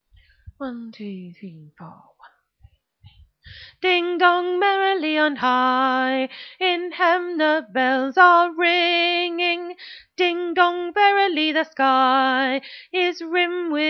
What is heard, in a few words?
Alto